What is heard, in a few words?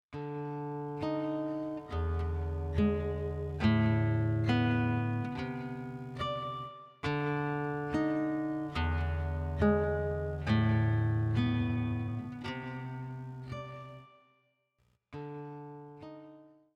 Symphony in Raga Navaneetam